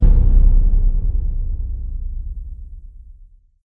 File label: amb random abstract; source engine; portal2